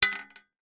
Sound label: stone down on metal drain cover; foley